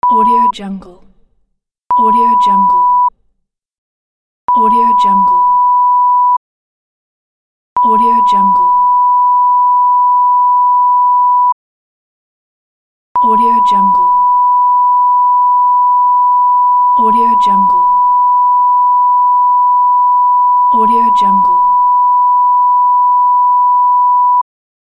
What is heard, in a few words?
censorship beeps; desmondsyner